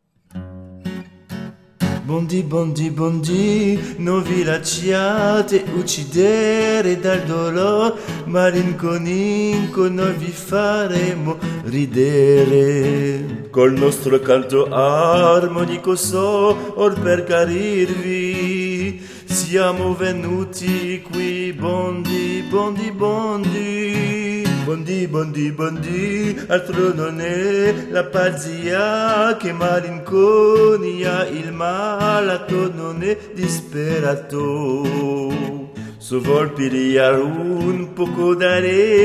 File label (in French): Voix Lead; Porceaugnac